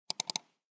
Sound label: mouse double click; everyday objects